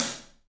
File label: PAISTE HAT, Drums; 3D Sound